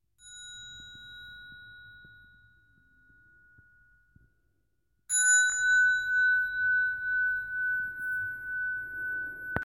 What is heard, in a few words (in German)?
Hexagon Klangschale